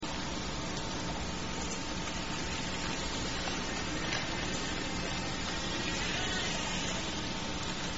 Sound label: longbuzz, transient killer whales; salish sea